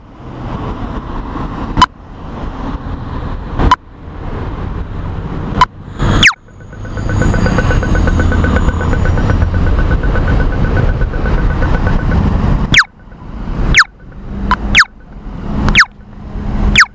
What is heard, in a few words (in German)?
Ampel, Singapur